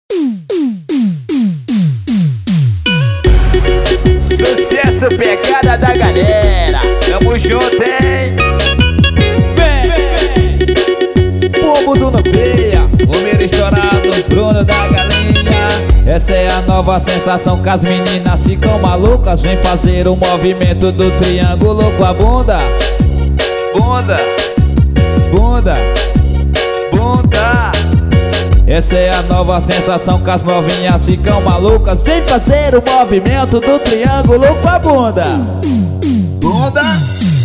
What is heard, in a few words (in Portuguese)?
swing, triângulo; swing dj